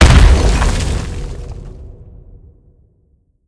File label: impact; smite; gilgamesh